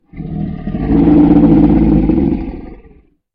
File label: Gold Dragon big roar; SyrinScape; Vault of Dragons